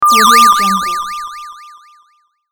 games retro radar beep

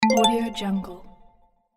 game win; biggest

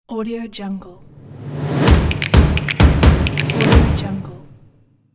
stomp and claps percussion logo

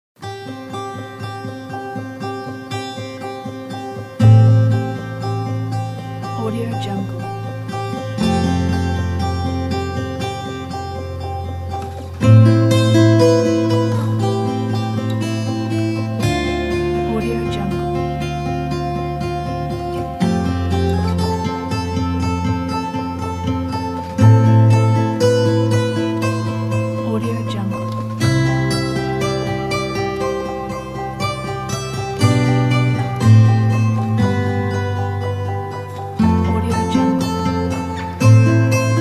inspirational testimony

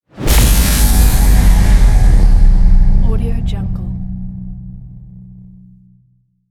electric strike logo

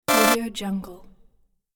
slim alarm

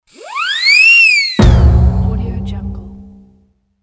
cartoon hit

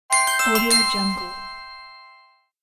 magic bonus; catsoundstudio

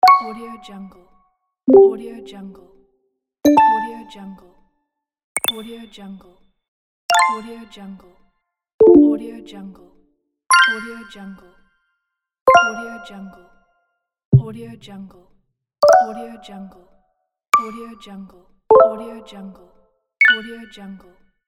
interface

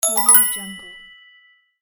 achievement

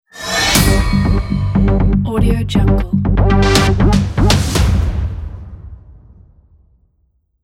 neoteric hitech logo

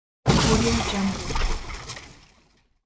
car crash

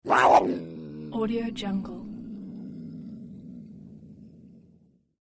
mountain lion growling; sound-ideas

